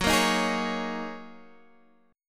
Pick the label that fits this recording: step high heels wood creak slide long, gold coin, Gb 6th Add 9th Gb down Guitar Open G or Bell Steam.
Gb 6th Add 9th Gb down Guitar Open G